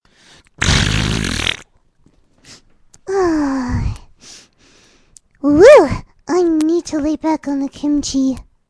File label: Farting; Vocaroo; Fluttershy